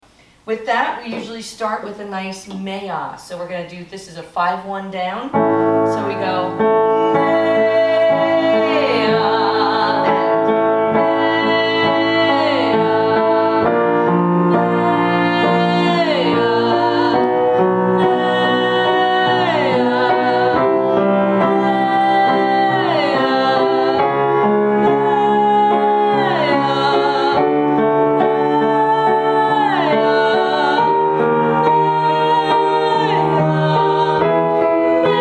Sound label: Vocal Exercise